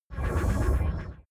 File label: sci-fi hologram message big; energie